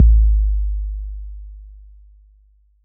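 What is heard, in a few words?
bass drum 808 Decay E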